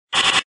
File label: card give; games; poker; American Poker The Legends II